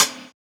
Closed Hat, JUNGLE BREAKS

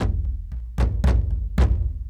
Kendong, World Percussion; Brekete

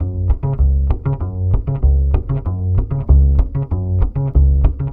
THA BASS, Balkanish Traditions; USB Soundscan vol.63; AKAI

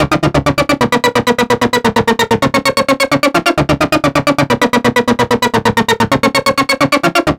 NRG Arp, trance

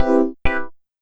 INST LOOP, House Side Of 2 Step; USB Soundscan vol.51; AKAI

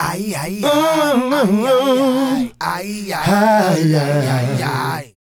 Male Group, Hip-Hop and RnB Vocals

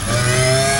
HYDRAULIC; Northstar; The Wizard VOL-1

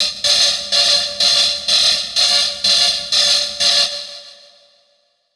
Verb Proc B, alarm siren, 125bpm; musicradar

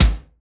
bass drum, Drum & Bass, 160 BPM; Ueberschall; Drum & Bass Flash Trackz

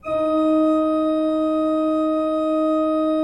D3, Cathedral Organ; ILIO; Propeller Islands Cathedral Organ

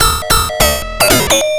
Atonaloop, Industrial Analog

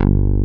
OB BASS; Pro-Rec - Synthsonic; BASSES

